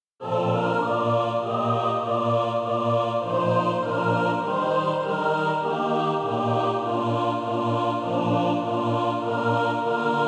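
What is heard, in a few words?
mixed, Practise Music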